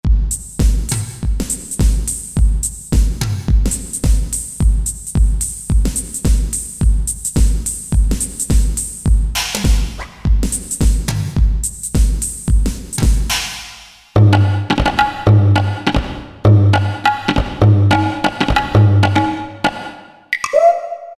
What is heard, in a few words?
House Drums, Roland SR-JV80-01 Pop